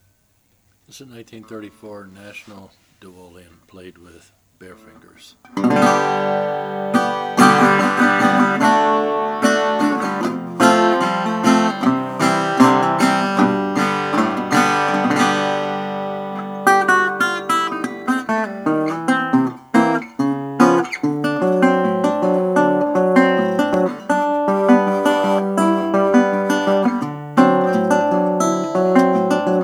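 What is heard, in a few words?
national duolian silver duco, Guitars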